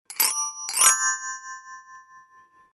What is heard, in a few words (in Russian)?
transformazyia, zvuki prevrasheniya